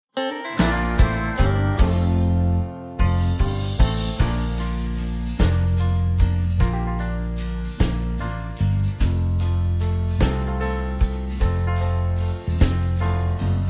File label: F No Backing Vocals, backing tracks